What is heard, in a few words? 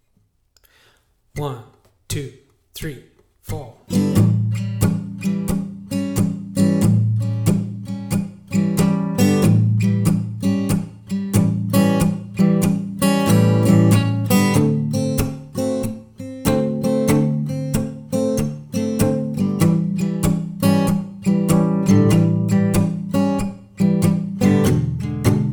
Backing Tracks The Turnaround, 12BB